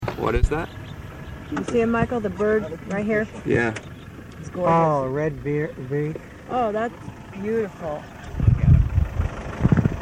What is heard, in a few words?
Bird Sounds